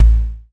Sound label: Yamaha TX-81Z BD